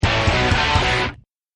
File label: kick powerup; phineas and ferb; snowbrawl